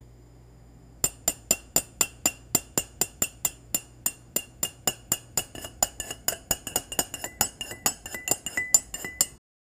cooking sound with a pot; kitchen

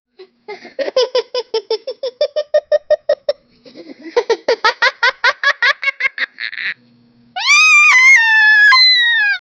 child laughing; joy

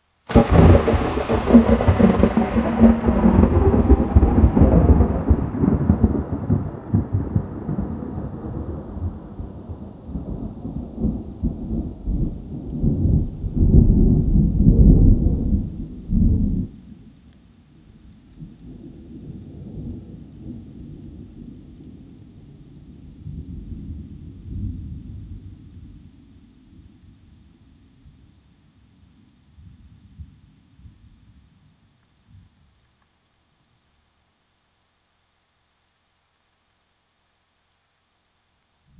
thunder sound effect summ, summer

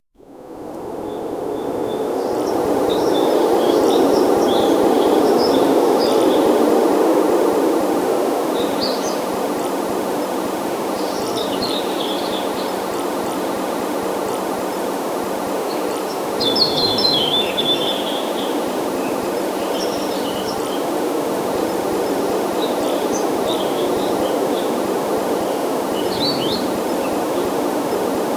calm morning ambiance in, birds chirping

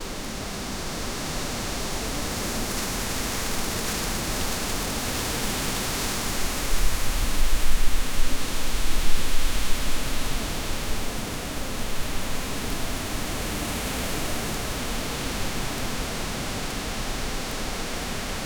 gust of wind blows

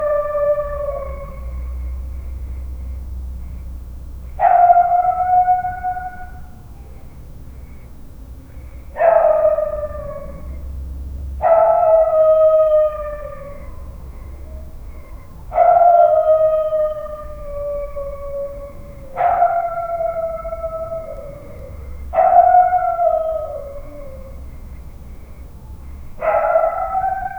distance local dog howlin

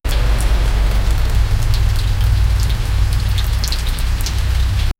realistic ambient rain fa, pavement

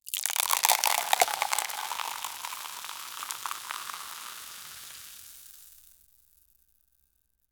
soda fountain, filling; make a soda fountain sfx

